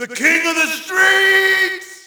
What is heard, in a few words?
Shout, audience; UFC Tapout 2